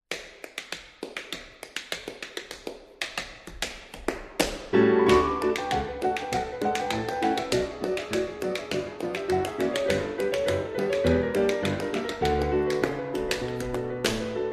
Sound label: tap dance; kattenburg